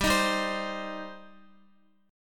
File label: G Suspended 4th Sharp 5th G, Guitar; Gsus4#5 strummed; x,x,5,8,7,7